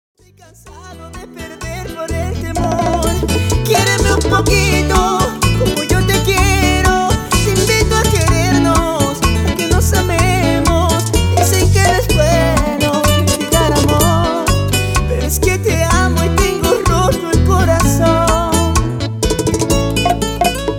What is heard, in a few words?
bachata